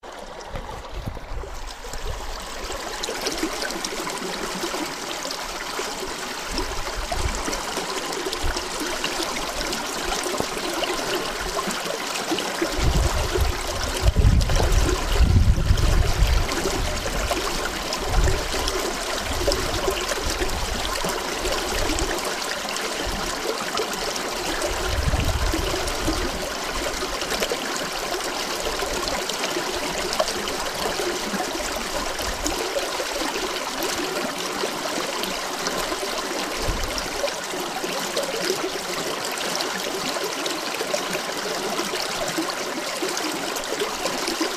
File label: ambient, strumyk; radkow